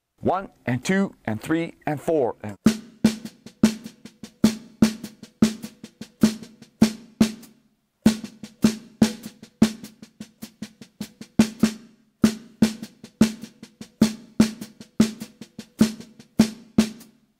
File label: Melody Triplets